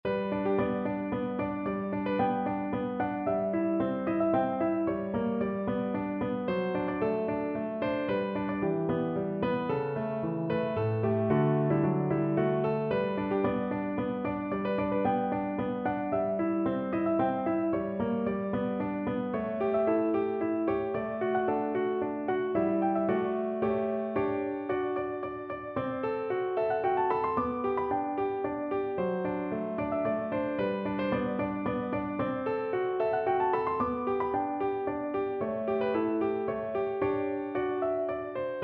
sonatine PNO, piano

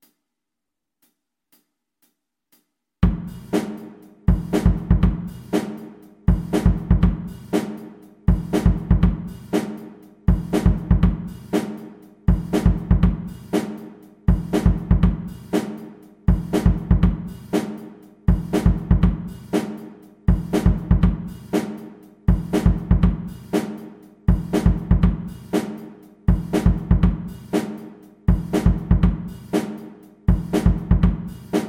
Funk Study, saxophone

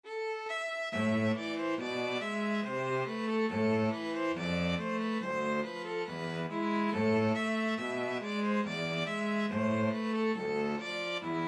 gavotte string trio

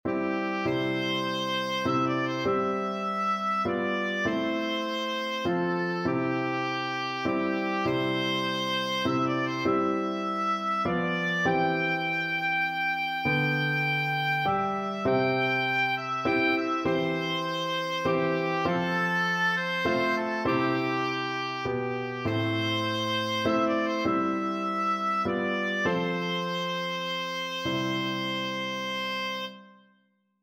amazing grace, oboe

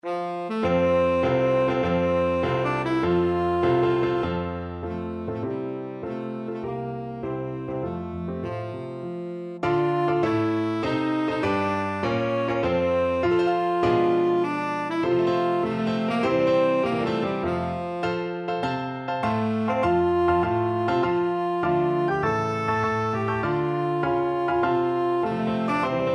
tenor saxophone

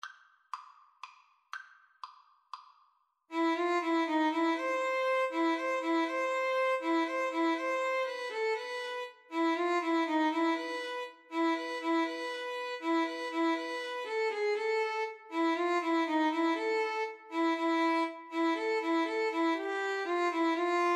la partida, Violin Cello Duet

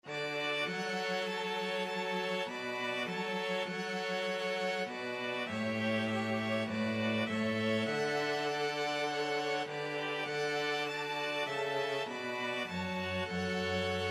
STRQ, string quartet; go tell it

